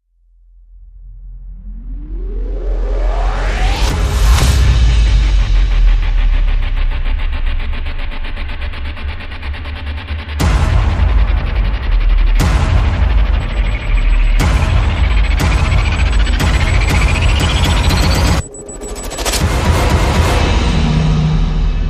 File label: Rise; Complex At The Core